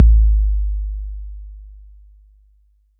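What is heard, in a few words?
bass drum 808 Decay E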